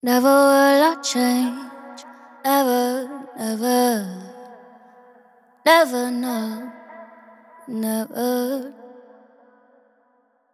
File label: Vocal Phrase Never - 100 BPM F# Min Wet; Vocals; Cymatics; ZODIAC Beta Pack